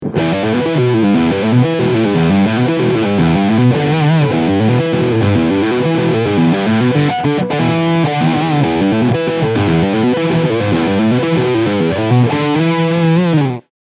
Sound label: ARPEGGIOLINES